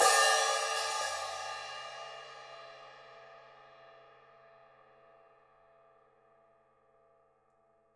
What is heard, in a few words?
Open Hat up; Digital Sound Factory